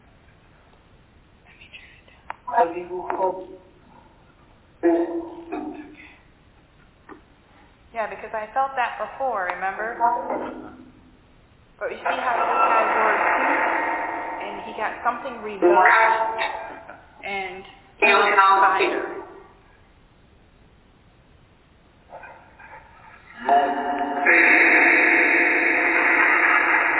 Phasma

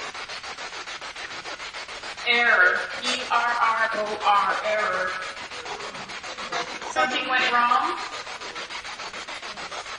Attic Rec Area Asylum Spirit Box

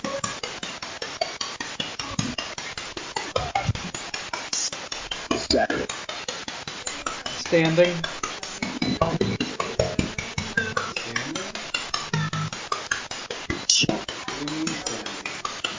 Train Station

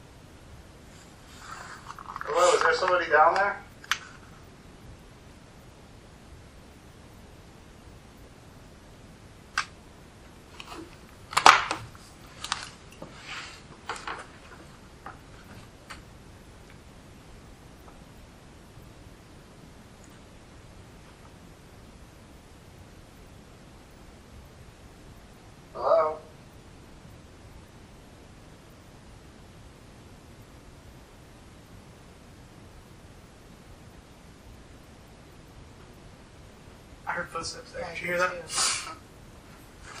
Catwalk EVP; twin city opera house investigation

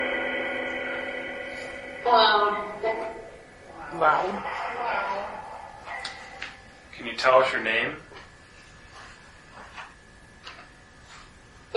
Attic Main Room Phasma Box

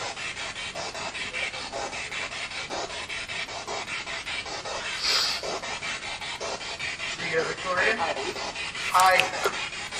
Balcony Spirit Box; twin city opera house investigation

